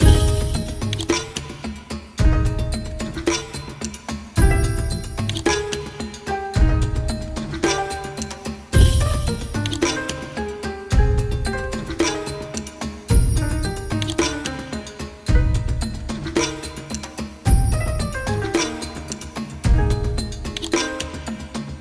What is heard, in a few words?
Ghosts; the legend of shadow mask of the force